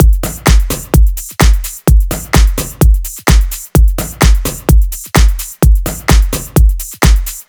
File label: Entourage Drums 2, 128BPM; Vengeance Samples; Entourage Kit